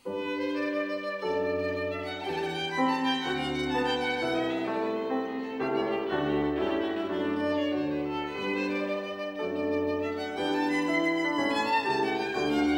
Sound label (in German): Violinsonate G-Dur - Variation II